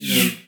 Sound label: Swipe Left; Pitch Black Audio Pong